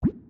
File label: modal hide; ore-ui